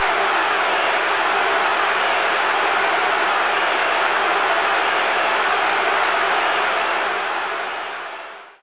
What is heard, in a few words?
booooo; Ssoccer